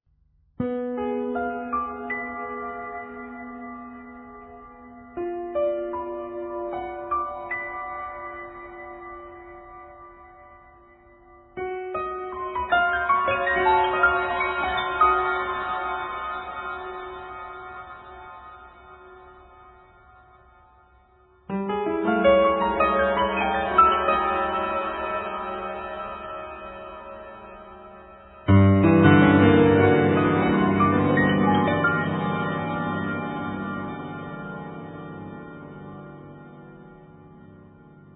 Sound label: piano texture; 闇夜に煌めくピアノの旋律